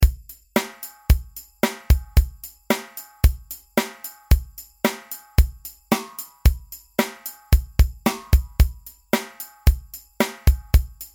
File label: Drums at 112